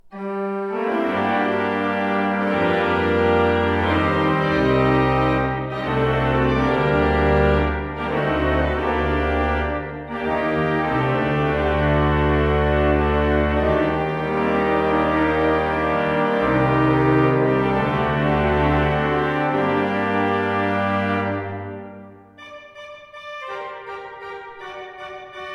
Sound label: pipe organ; Schuke Potsdam 25 II P